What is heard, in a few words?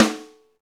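Snare, Roland; Club-50 - Foundations; Naturals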